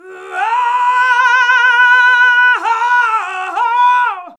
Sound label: VOX, Singin